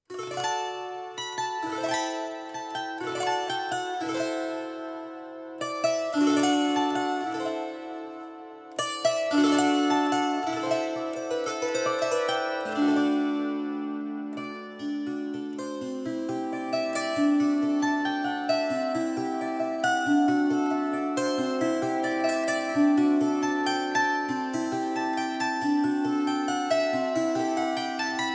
nach skazki gusli, muzyka dlia nachala skazki

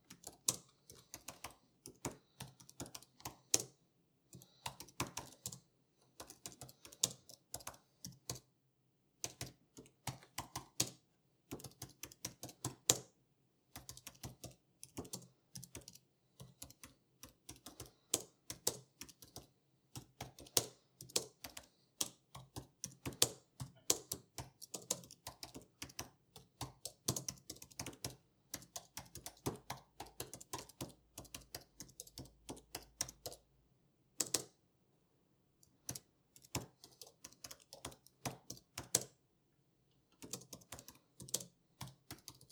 klaviatura imac

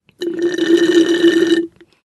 zvuk pitia s trubochki

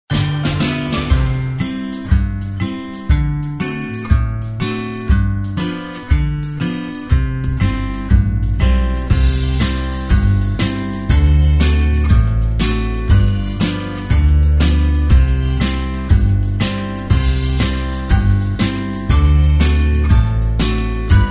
rojdestvenmuz

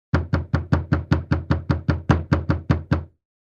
stuk v dver

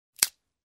hrust vetki

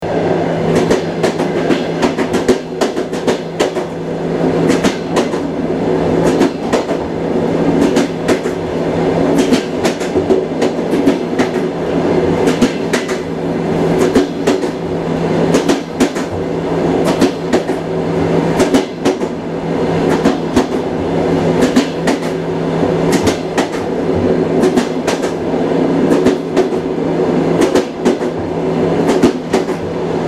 poezzd stuk

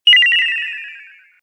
zvezda padaet

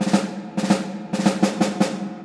barabany ojydanie

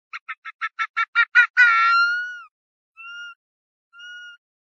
pesec

